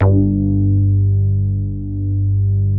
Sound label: G2 RAP BASS, NY SYNTH; Northstar - New Gold VOL-2